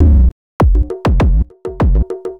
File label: drloop, drumloops, Vinyl Attack; Masterbits